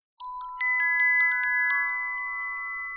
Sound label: denoising glock 20dB mix